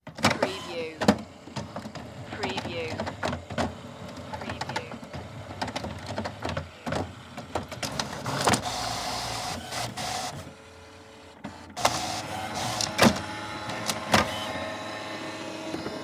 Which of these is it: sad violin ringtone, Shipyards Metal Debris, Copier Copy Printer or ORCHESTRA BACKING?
Copier Copy Printer